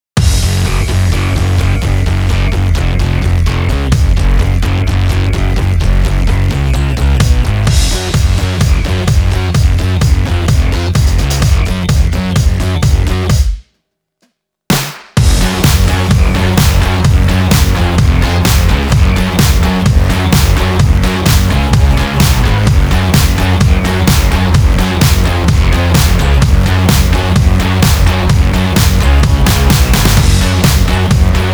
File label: (Instrumental)